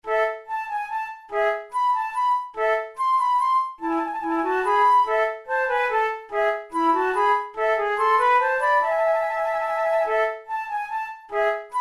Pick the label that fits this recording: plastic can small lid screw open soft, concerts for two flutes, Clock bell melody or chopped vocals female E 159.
concerts for two flutes